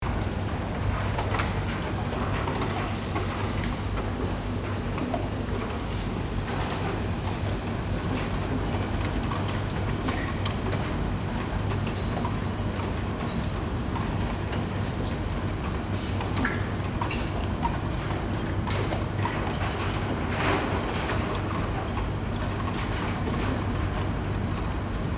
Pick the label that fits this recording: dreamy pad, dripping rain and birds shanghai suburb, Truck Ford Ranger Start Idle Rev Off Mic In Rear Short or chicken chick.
dripping rain and birds shanghai suburb